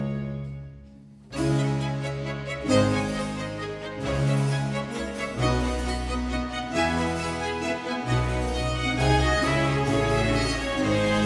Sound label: Concerto grosso in D minor; Mahan Esfahani; GEMINIANI